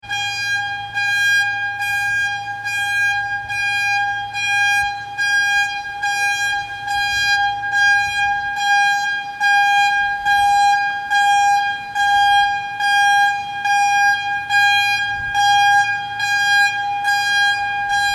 Construction Explosion, Warning signal before and after dynamite explosion on; Warning signal before and after dynamite explosion on construction set. Calm enviroment with some people passing; Construction Sound Effects